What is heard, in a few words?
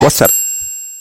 whatsapp filler; toque de celular